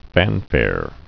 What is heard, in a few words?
fanfare